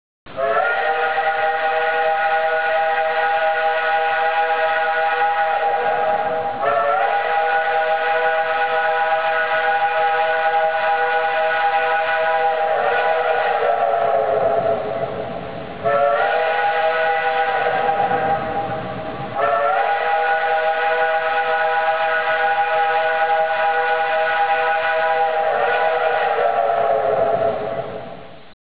Steam Wistle

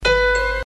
piano

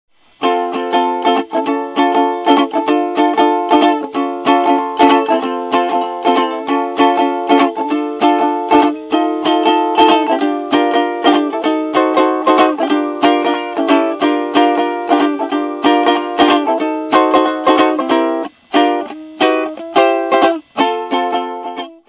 uke

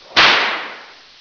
crack

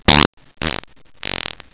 fart

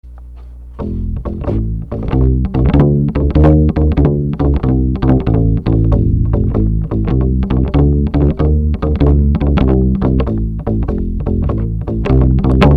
rubber bass

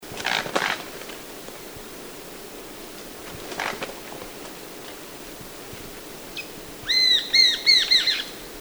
Eurasian Jay, birdcall

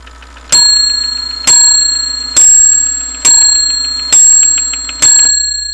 clock

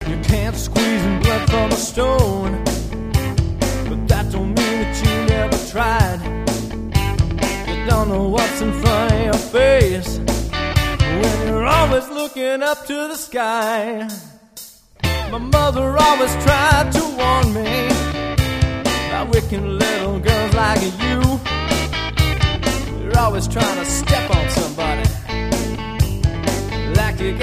Paycheck Blues

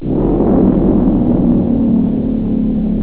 monster

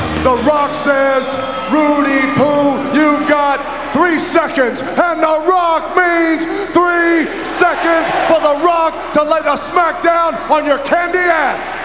rock

